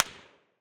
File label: mid, whizz; tacz-tweak-pack; taczmechanics